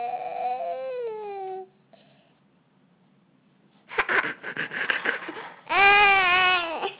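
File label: baby hungry, male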